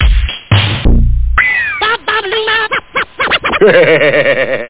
beat

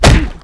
program error; Harley Davidson (90th Anniversary)

